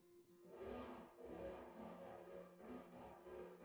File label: OKTAVAS